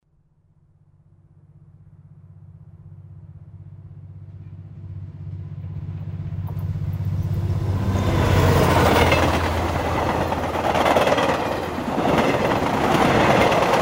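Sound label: at the railway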